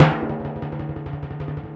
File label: Orchestral Perc, Timp FX